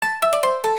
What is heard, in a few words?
Vocaloid Nursery Rhyme